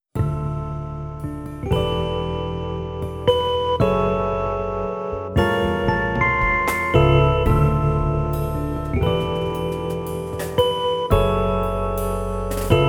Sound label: electric pianos